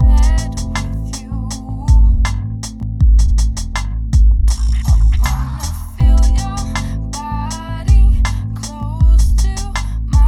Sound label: Liquid RnB, free loops